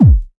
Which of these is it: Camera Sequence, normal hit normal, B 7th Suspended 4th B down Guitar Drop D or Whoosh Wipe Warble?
normal hit normal